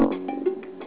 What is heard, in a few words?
Pipe